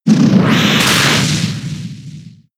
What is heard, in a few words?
Kick; pokemon sfx gen 6 attack moves xy oras; Blaze Kick